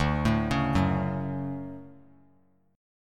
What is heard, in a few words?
D Major 7th, chord; arpeggiated; JGuitar